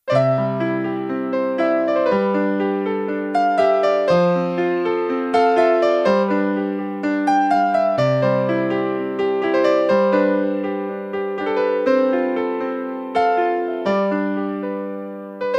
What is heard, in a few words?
Original Piano